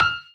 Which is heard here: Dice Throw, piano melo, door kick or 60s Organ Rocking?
piano melo